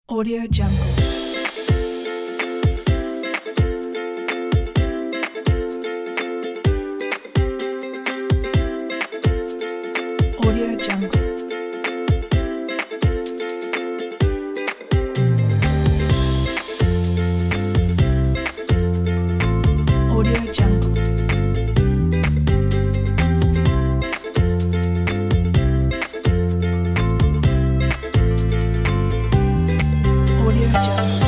ukulele summer

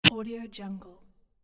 reverse blip toggle

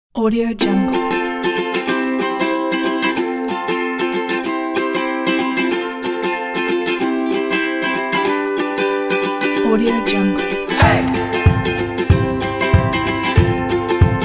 upbeat charm; noiseworld

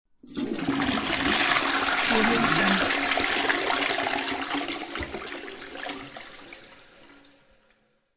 toilet flush

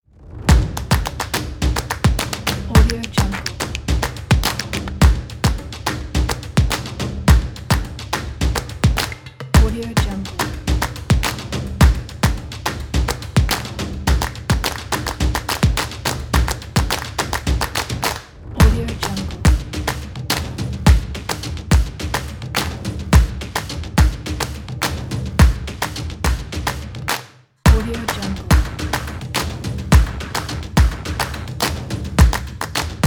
industrial claps

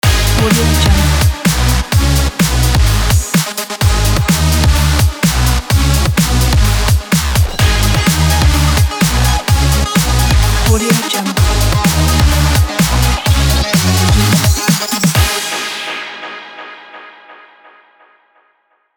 power bass breakbeat ident

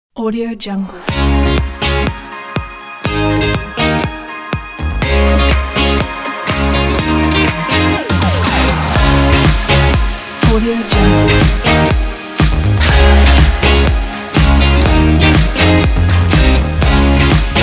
happy

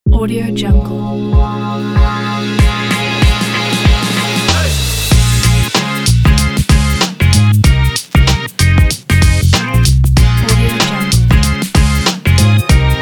hiphop in

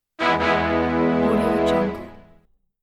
tada fanfare

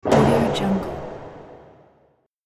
explosive sound

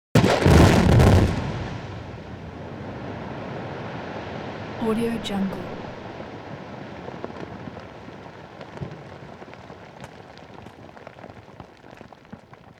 dynamite explosion with falling debris; hollywoodedge

